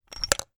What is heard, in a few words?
household torch flashlight maglite battery x1 insert; zapsplat